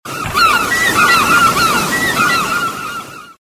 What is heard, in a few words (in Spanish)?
gaviotas mar